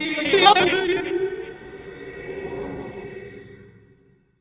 Vox FX

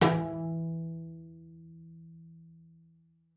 E3, bass pizz; Xsample VOL-5

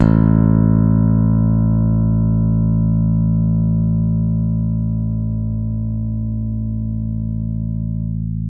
open A1, Bass; Masterbits CLIMAX 7 - Bass; KEN SMITH BS

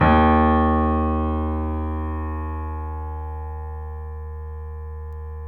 D#, The Piano; InVision Interactive

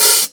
HH jazz OP; EMU Orbit-3

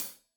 Hat Tight, Hi-Hat, Cymbals; Deeply Sampled Drums

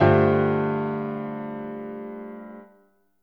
Piano Chord

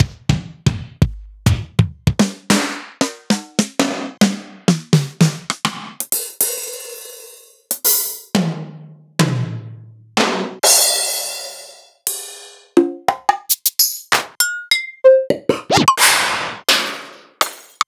Alesis HR16B

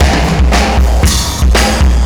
System Overload Beat, Processed Drumkits

